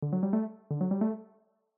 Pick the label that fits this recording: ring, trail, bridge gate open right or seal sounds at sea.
ring